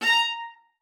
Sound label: MART A#5, VIOLA SPICC; Xsample VOL-5; 90 s Sample CDs